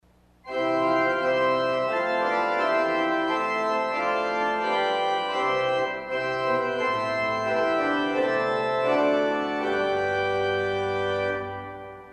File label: Fourniture St Anne, organ stop; Möller 1951; arpeggio; CulverAcademy